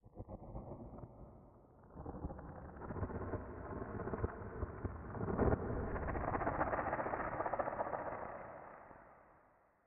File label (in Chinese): 下界荒地点缀声, ambient